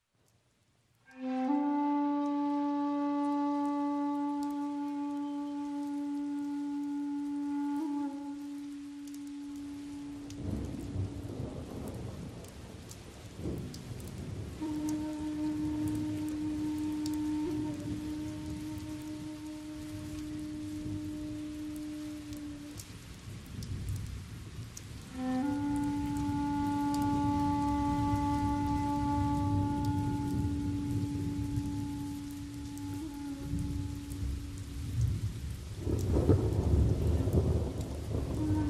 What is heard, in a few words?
Rain and flute